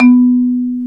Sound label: Bass Marimba; Roland LCDP11; Africa VOL-1; Af.Marimbas